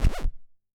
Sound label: Scratch, drum